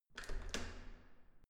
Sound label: entrance door handle use hard